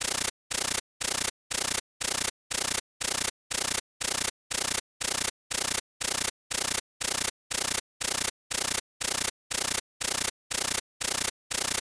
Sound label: panned noise